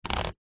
leisure foosball plastic score slider single slide